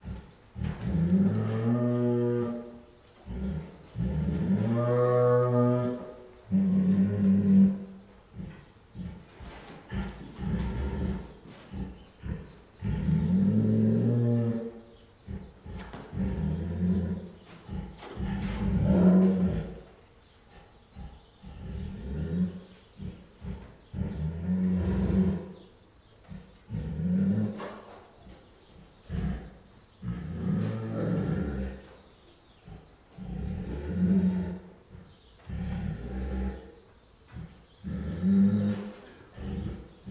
Female Bengal Tiger at Zoo, with Growls and Whines; Tigers; Sound Ideas